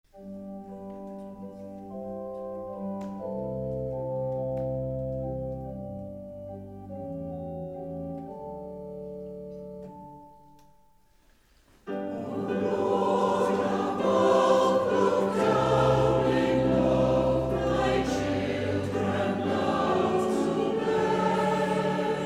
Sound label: Choir, hymns